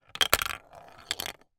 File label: toy marble run marble fall into plastic base